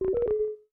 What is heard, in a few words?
Account Control, Windows 7